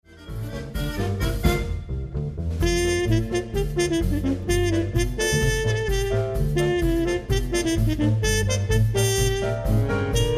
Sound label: wahwah mute